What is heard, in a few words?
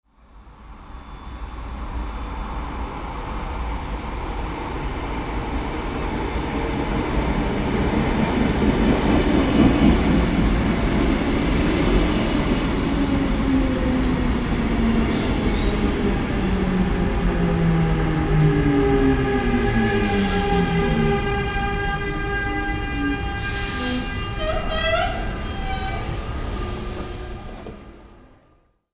train arriving at station